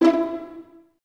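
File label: violins E4 pizzicato, String Ensembles; Miroslav Vitous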